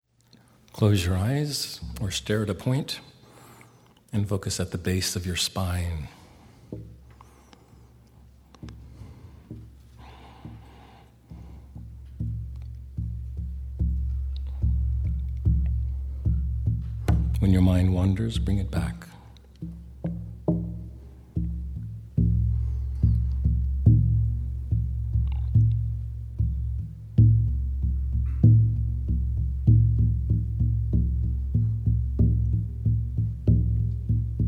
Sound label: 1st Chakra Sound Exploration